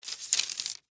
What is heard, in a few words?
Arrow Into Quiver, Warfare, Arrow, Into, Quiver; Bow And Arrow Sound Effects; Combat